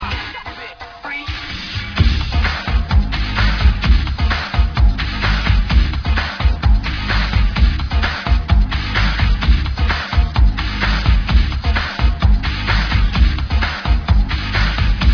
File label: rock